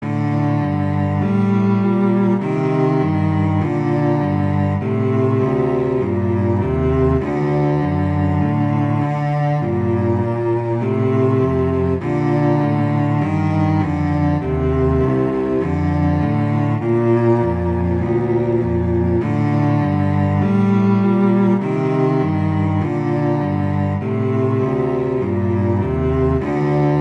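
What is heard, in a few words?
Duet Romanza, cello; Pleyel; Duets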